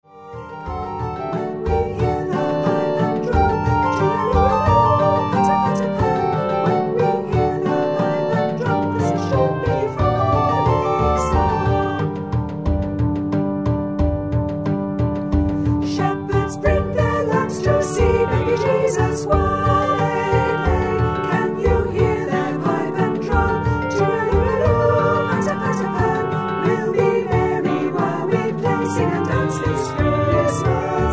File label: Patapan easy band; patapan arr